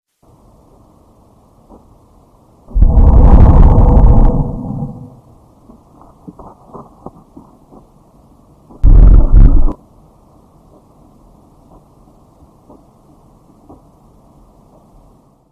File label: creepy sound; baldis basics